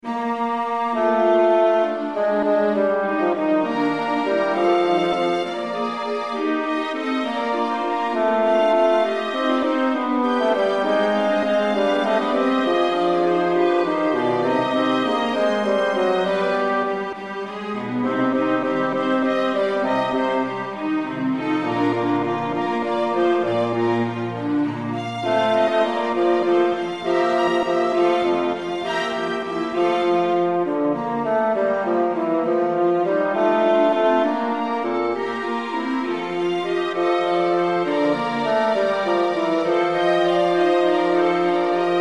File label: Organ Sanctus